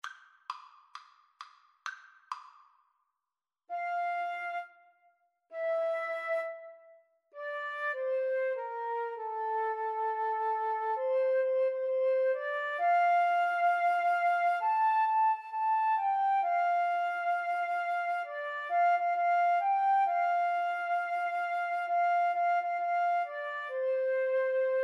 Clarinet Flute Duet

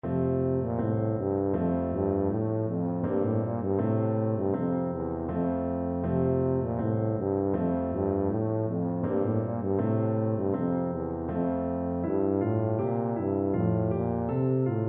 deck the halls, tuba

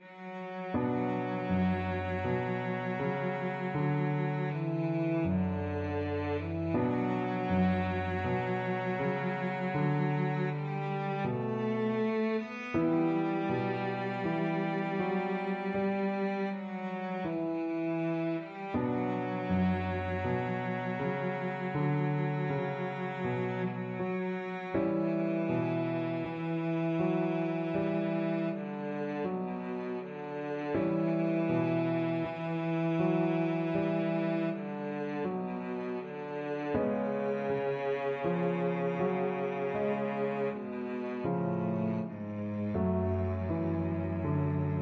bizet perl fishers, cello

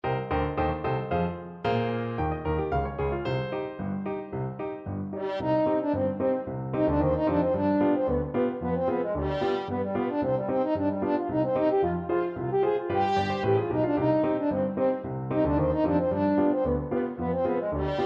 sadegurer khosid, french horn

